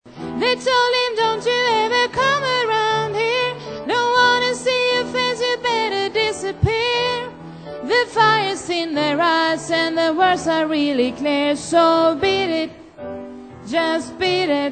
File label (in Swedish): sopran